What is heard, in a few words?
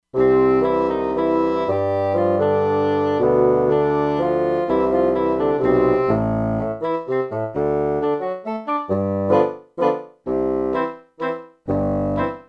Section Winds; Factory Presets; E-mu Proteus-2